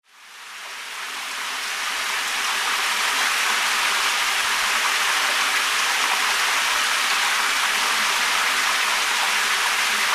odgłos lejącej wody

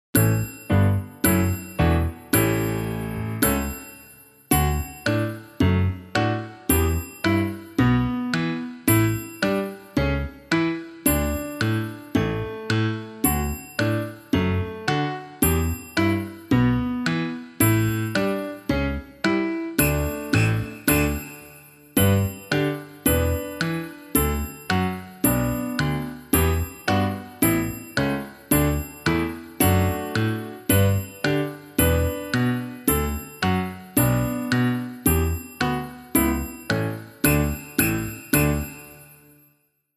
muzyka do marszu

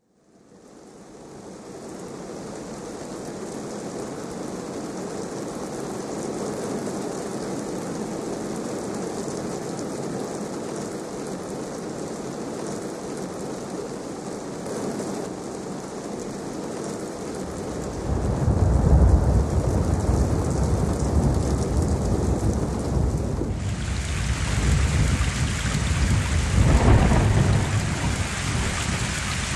odgłosy deszczu

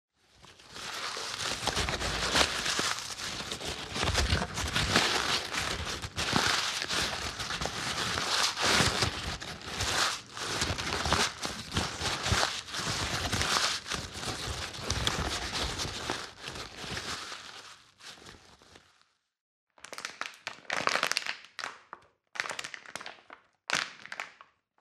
odgłosy papier plastik szkło

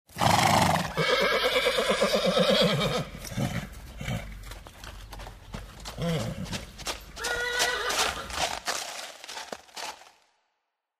odgłosy zwierząt

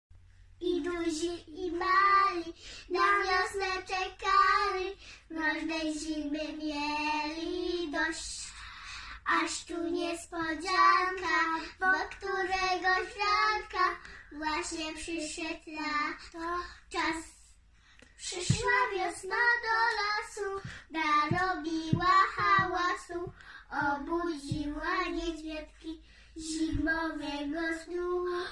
piosenka dziewczynki

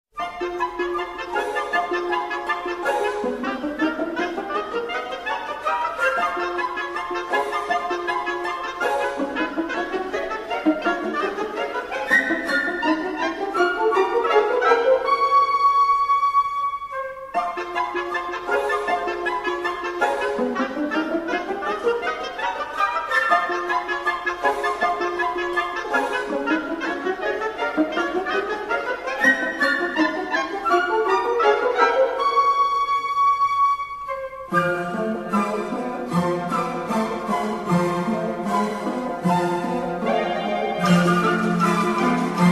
muzyka

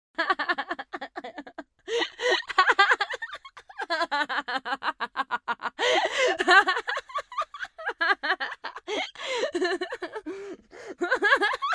odgłosy śmiechu

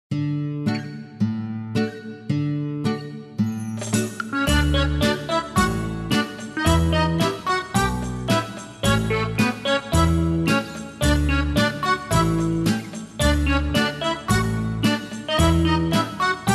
spokojna muzyka

